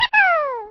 throw; pikmin

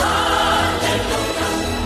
holy hand grenade; worms

